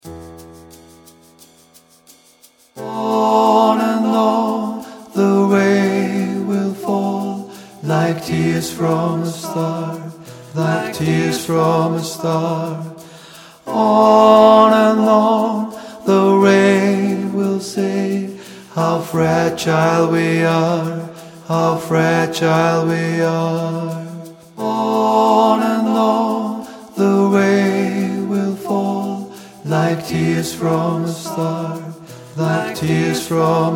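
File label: chorus bass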